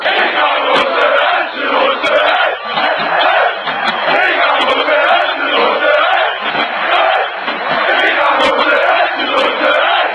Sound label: fan chants; onetime odense always odense